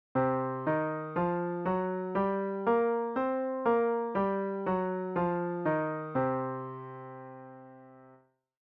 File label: blues Scale